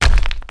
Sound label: Rocky Assemble Hit; Ruins; Timez Attack